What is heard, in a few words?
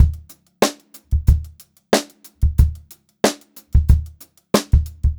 Funk-Groove Drumloops